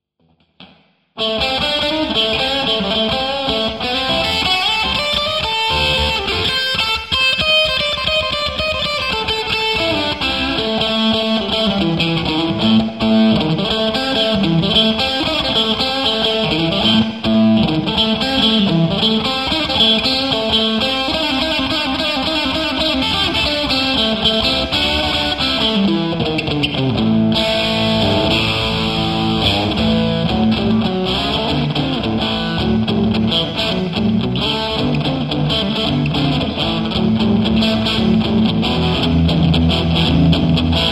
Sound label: JCM800 OD; P90